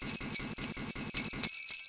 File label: c4 xmas beep